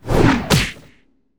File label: Wind Punch